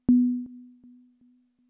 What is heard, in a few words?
dropped